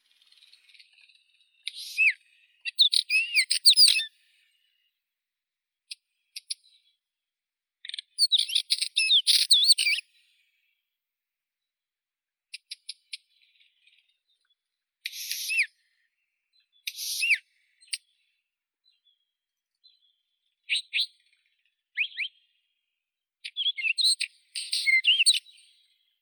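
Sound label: black-necked wheatear, Oenanthe finschii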